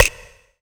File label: RIM, 85, Hip Hop R n B; USB Soundscan vol.19; AKAI; TIMBA